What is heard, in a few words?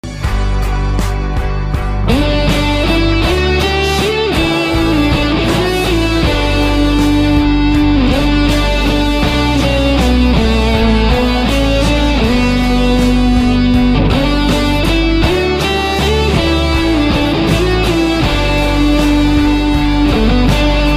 electric guitar version